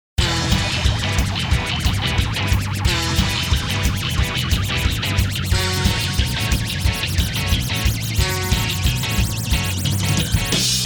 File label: Rock And Roll